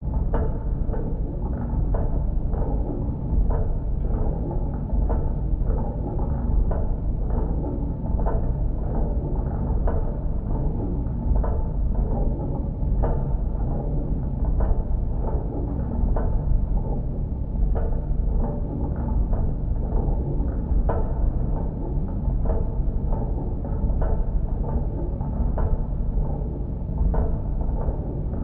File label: alien tank vehicle interior movement steampunk gears movement heavy alien space; sci-fi